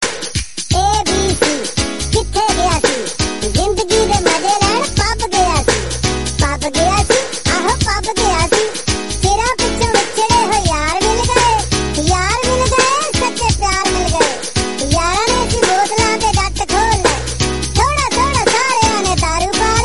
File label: umma ummah baby voice funny notification